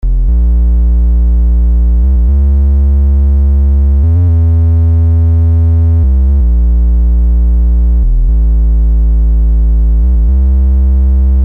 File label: raw tri; tellun